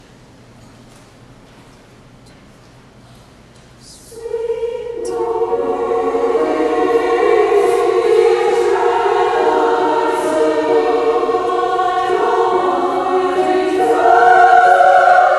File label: Swing Low